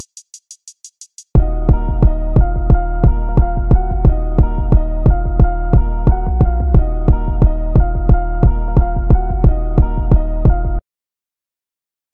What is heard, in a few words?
Instrumental